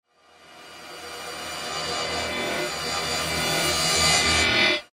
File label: Sweeper Musical Reverse; Production Element Imaging Element Accent Transition; Musical Sound Design Sound Effects